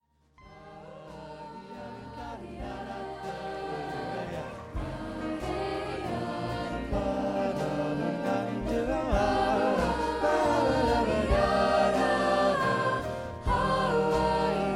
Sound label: Ensemble Sample